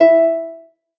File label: lyre